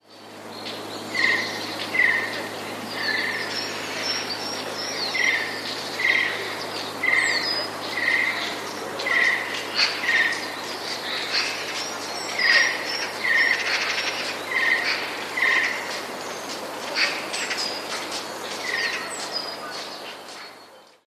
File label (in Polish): sojka; wysokie, dźwięczne krakanie